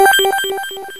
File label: Pinball